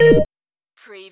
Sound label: Servo Panel Sound - Transit